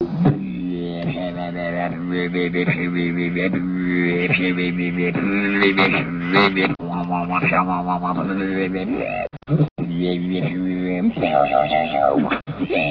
Dubstep

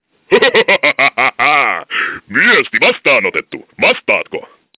Evil Laugh

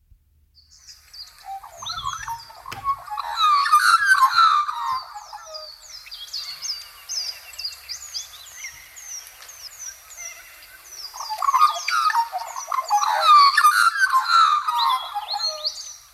Australian Magpie

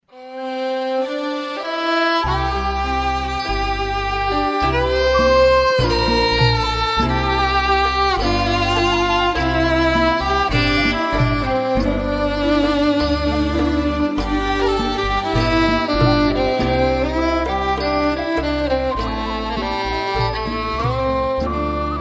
Thai Music

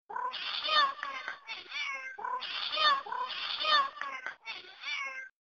Cats Meow

